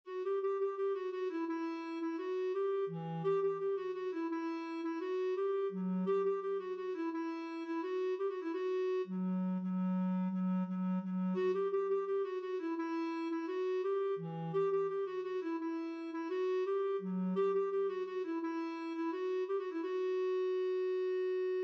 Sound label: Clarinet Bb